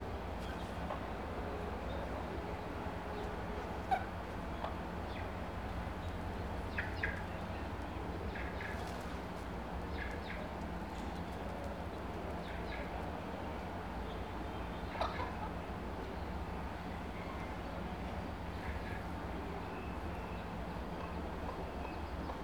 Galinha cacareja corre e bate asas pela rua de pedra, passaros e pessoas; aves; longe

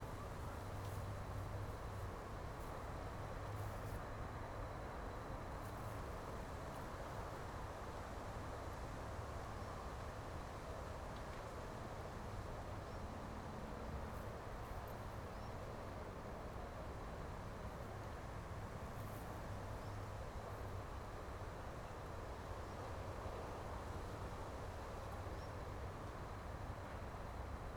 Ambiente residencial rua com transito longe dia moto longe

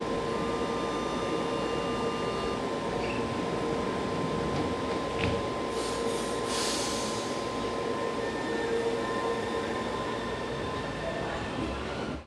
Captacao Afastada da Porta do Metro de Brasilia com Sinal de Alerta; portas

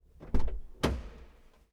Porta de Carro Aberta Gravacao Interna em Garagem Subsolo; Colecao Sonora do Cerrado

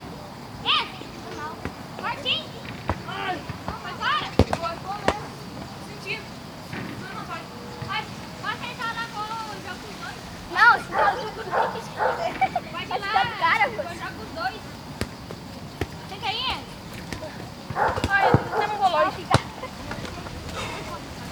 Criancas conversando alto e jogando bola ambiente rural, ventando nas arvo; passa; Cerrado

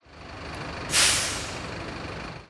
Ar Comprimido do Sistema de Freio de Onibus; maquinas

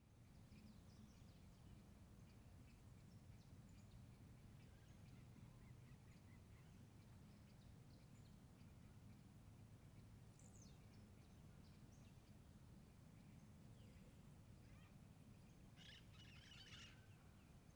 Ambiente externa em chacara com passaro fim de tarde periquitos

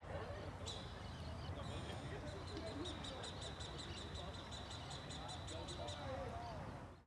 Coruja Buraqueira em Bosque; aves; canais surround 5 1; da Universidade de Brasilia

